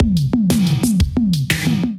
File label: Kit, drums; Wild 'n' Free Kit; Ableton; Drive and Glow